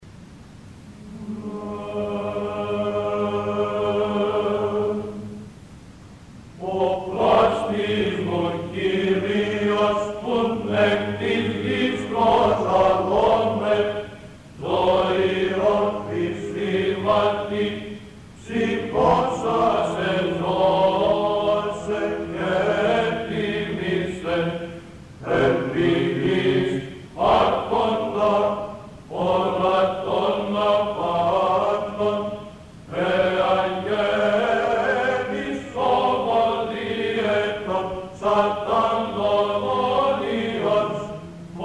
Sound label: Prosomoio Tyrofagou Pl B Tetrafwnos, Choir